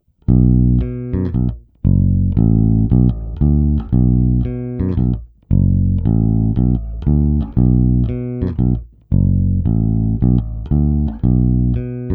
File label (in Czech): Sériové zapojení, Music Man Sterling baskytara; Ernie Ball Music Man Sterling